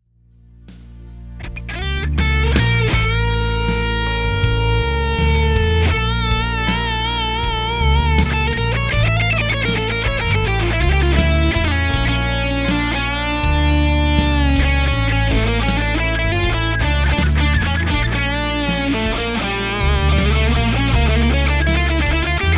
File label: phrygian dominant